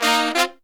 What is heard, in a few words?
Phantom Horns, 132BPM; 90 s Sample CDs; Zero-G